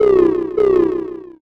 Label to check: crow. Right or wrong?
Right